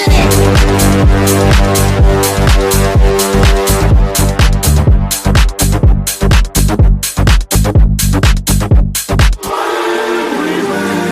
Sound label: hot electro